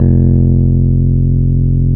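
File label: E PNO F0; Synclavier Sampler Library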